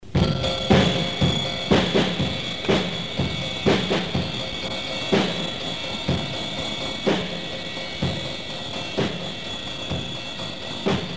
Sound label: drum